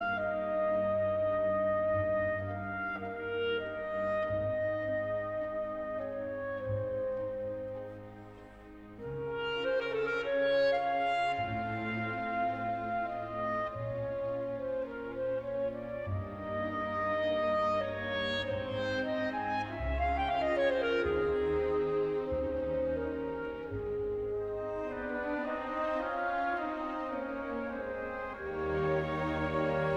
Klarinetconcert Adagio

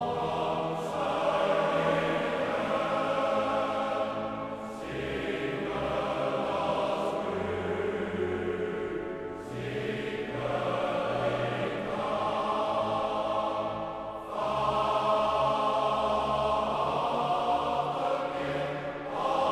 Koor (mannen); Chr Residentie

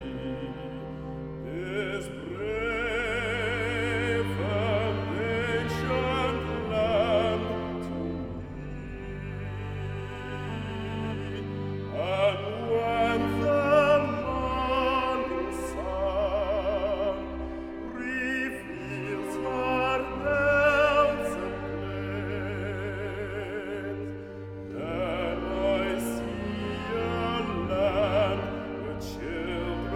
Koor (mannen) mannen ensemble; Urker

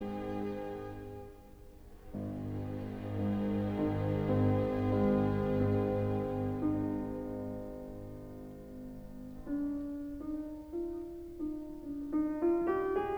Pianoconcert in F minor

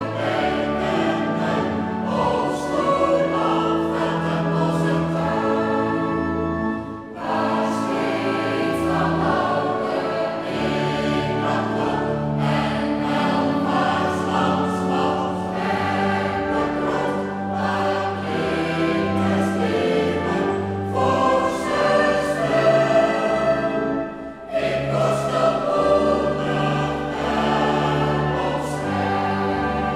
Zeeuws volkslied

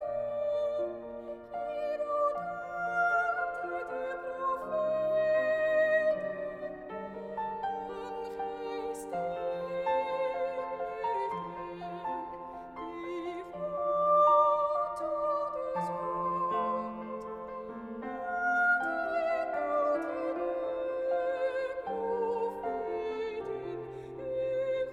solist vrouw

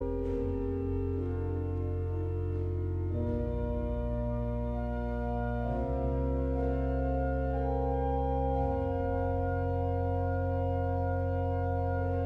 Koor (mannen)

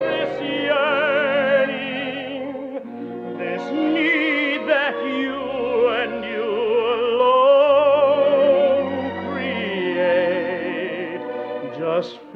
applaus

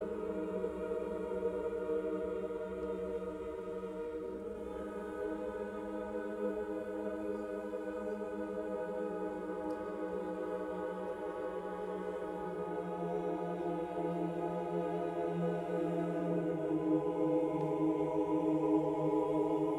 Koor (mannen) Byzantijns; Heruvimska

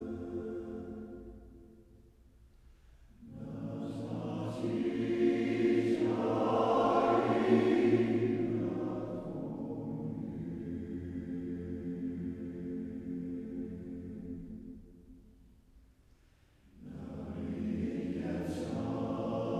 Koor (mannen)

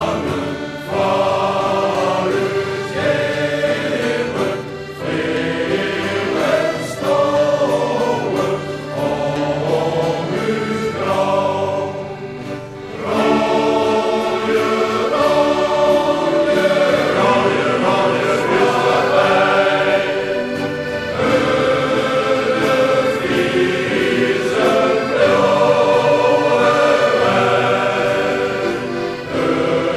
Koor mannen

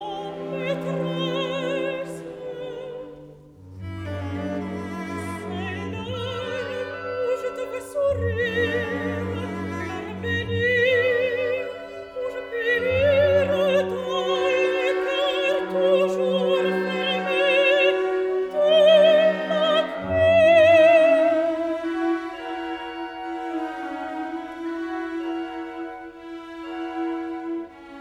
Bloemenduet; Lakme

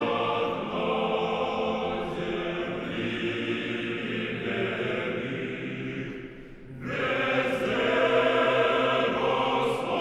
Koor (mannen) Russchisch orthodox

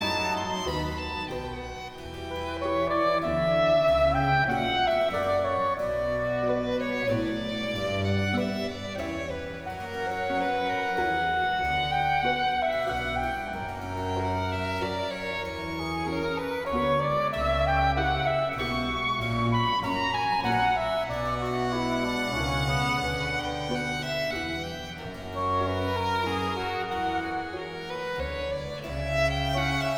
Concert voor hobo Adagio C-minor; BWV 1060a

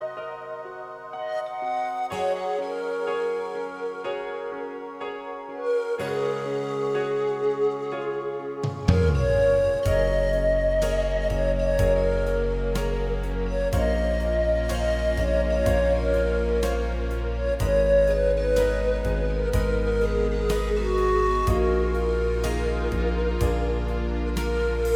panfluit

